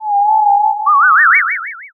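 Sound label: uguisu, Japanese bush warbler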